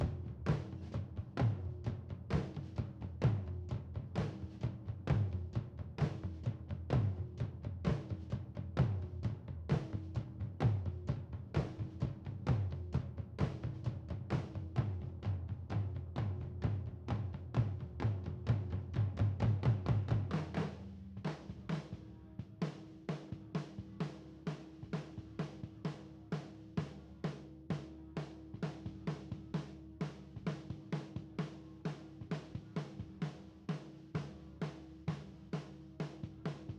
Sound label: Drums - Toms Verse through Chorus